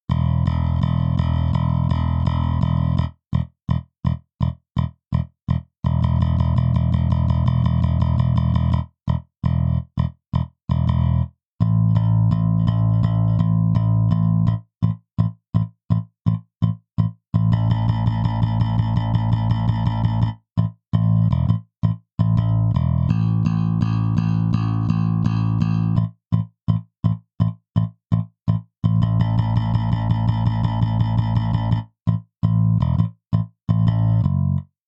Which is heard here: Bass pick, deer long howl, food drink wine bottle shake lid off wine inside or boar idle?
Bass pick